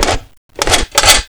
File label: can opener Reload